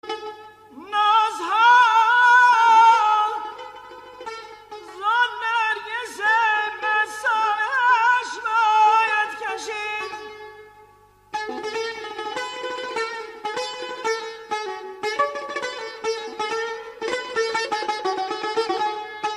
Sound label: Edameh Saz O Avaz